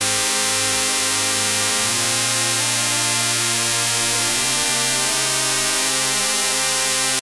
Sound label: full strings; full strings white; nonstat